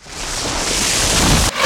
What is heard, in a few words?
Triple Shot; Pc Skill; FlyForInsanity